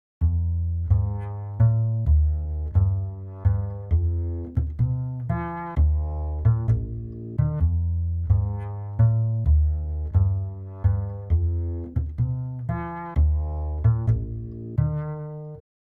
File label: bass, 130BPM; Pony Fan Music Remix Stems